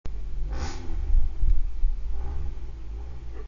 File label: inside cold raspy